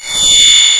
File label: Laser; 3DShooting